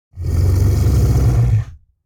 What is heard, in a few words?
Lizard creature growling snarling